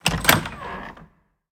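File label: doors wood single light open; skyrim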